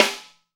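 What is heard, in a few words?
SD, snare drum, Real-Kit; 90 s Sample CDs; Sound & Vision - Gigapack I CD 1 (Roland); Real-Kit m14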